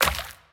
puddle

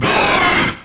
monster yell

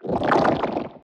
growl small; fistula

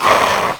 monster yell

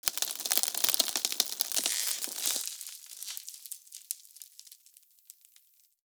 greed turns to gold; greed death

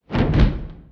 wing flap; dogma

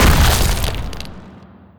hellboss ground pound

